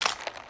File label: idle rattle; creatures organic